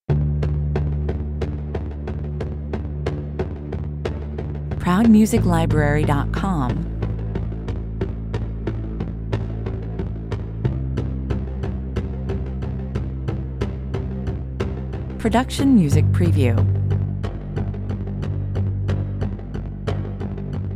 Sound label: no lead perc